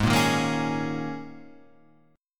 G sharp Suspended 2nd Suspended 4th G sharp Guitar Half Step Down